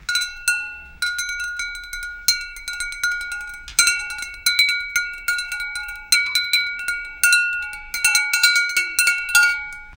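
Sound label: ritual bells goat bleating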